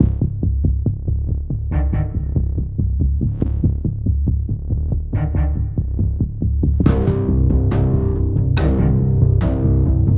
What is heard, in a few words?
Worm Music